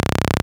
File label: P5 SAW, Vintage; INIS - Vintage